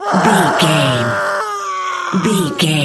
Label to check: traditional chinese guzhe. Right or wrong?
Wrong